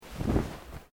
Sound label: Clothing Kimono Sleeve Move